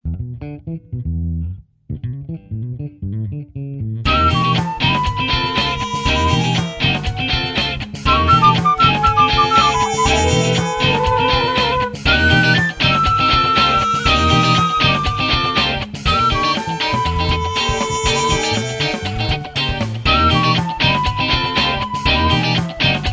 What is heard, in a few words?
stab